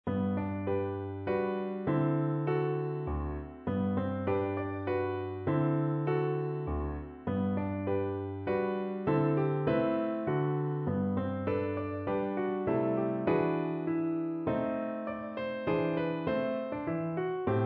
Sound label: waltz